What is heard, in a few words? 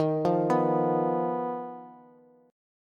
Eb Suspended 2nd Sharp 5th, Bass; Ebsus2#5 arpeggiated; JGuitar